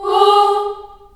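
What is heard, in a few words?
G#3 Choir Children Ohs, Choir Acoustic & Synth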